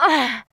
Grunt; Lewd SFX

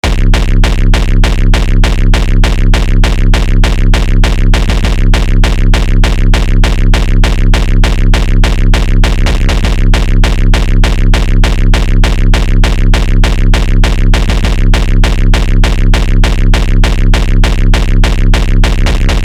kick

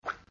slosh; Lewd SFX

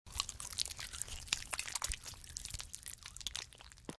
Suck; Lewd SFX

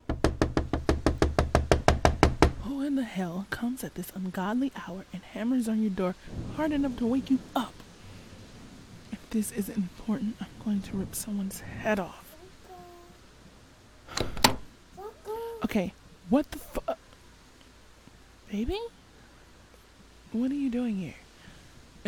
Kissing; F4M; Cougar and her Cub Pt.2 Rainy Night Revelation; Older Woman; Younger Guy; Cuddling; Adorable; Very cute

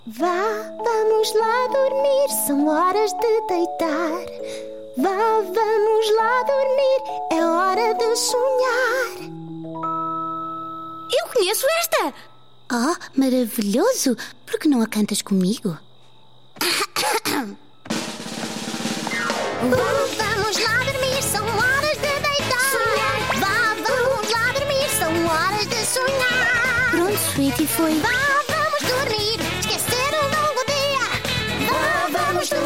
Hush Now Quiet Now (European Portuguese); Season 1

